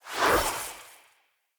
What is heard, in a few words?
Staff Caster Cast Short; Weapons; Staves; Starter Staff Caster